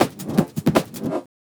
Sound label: LOOP SD, 160 BPM